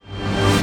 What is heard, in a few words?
Tonal FX G